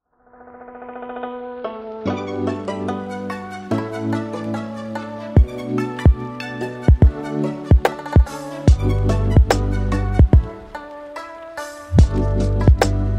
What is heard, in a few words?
lofi; My Hero Academia but is it okay if it's lofi?